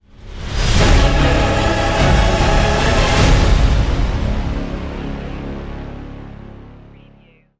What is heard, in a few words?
battlefield stinger